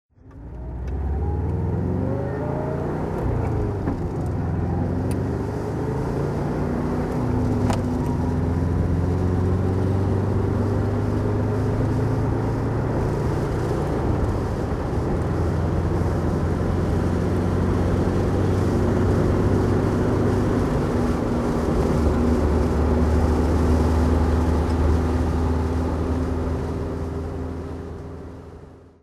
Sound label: vw porsche 914 driving